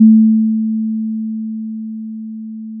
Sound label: sine